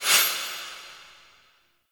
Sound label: Shawzin Score Window Open; Warframe